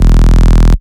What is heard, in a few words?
Bass Shot E